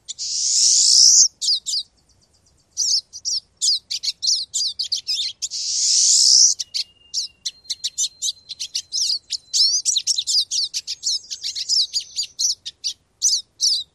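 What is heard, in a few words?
song, Pine Siskin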